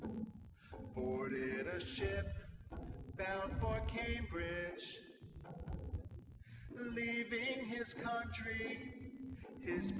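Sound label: nodrums instantaneous source; SiSEC08; SiSEC underdetermined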